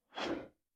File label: Swish Metal Blow; Whooshes